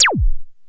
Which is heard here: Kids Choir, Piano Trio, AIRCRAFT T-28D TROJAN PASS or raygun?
raygun